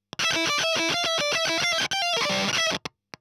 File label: EL Fuzz End